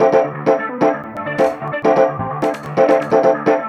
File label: Clav, Funk Keys; Sonic Foundry (Sony Creative Software); Synchro Funk